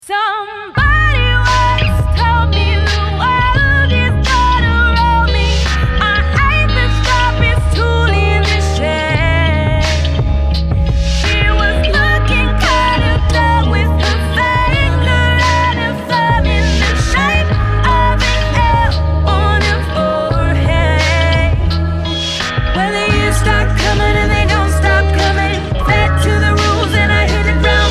ringtone

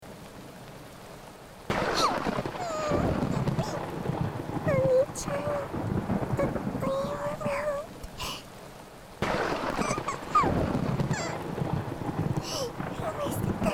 thunderstorm ASMR

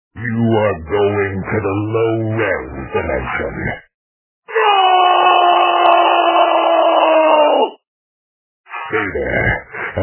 low res dimension

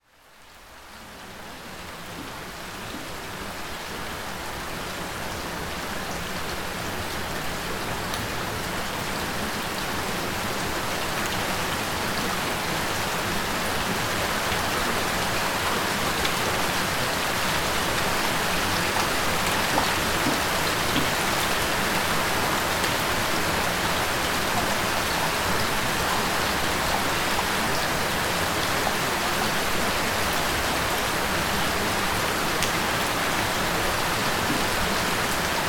Gentle Rain Sounds; Lipedema Healing; Desired Lower Body; Healthy Legs

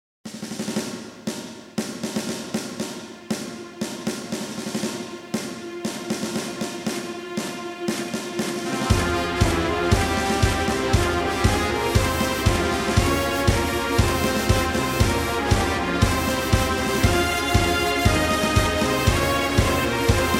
Ukrainian Army March

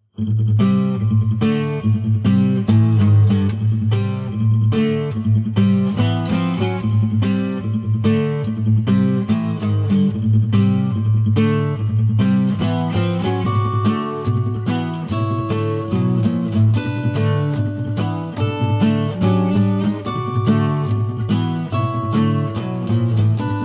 Acoustic; Boss Battle 1; Legend of Dragoon

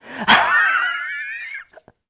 Wheeze

